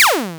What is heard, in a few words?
laser zap